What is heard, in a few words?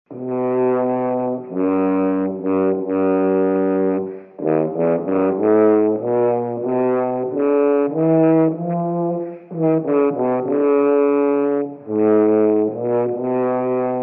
tuba excerpt